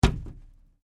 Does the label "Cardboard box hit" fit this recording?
Yes